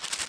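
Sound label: scroll down; allods2d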